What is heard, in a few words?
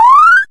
jump, Retro Sounds; Kenney's Sound Pack; Retro Sounds 1